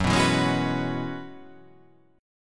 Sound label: F sharp 9th Suspended 4th F sharp, chord